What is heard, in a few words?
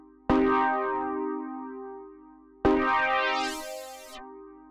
Chords; simple session